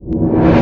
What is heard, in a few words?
Disguise Kill; blackshades